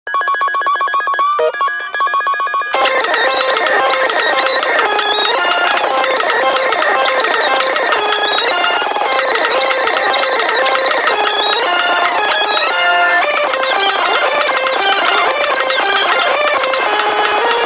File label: Thunderstorm